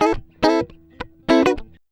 104 FUNKY, Electric & Acoustic Guitar Loops

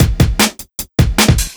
Drum Loops, 152; ScrubBrush; M8; Electronisounds

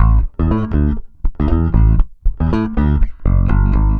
SA BS AM, BASS SALSA

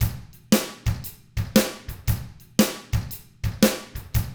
SHUFFLER, Drums; ILIO; Double Platinum Drums 1; 116 SHUFFLER

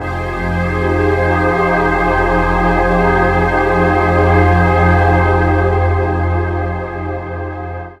pad aaaah F

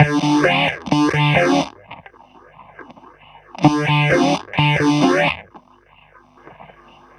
GTR, Roots Disco; USB Soundscan vol.32; AKAI

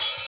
OP HAT; East West

